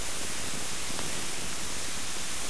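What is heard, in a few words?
fire torch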